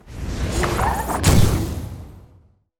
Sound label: succubus; monster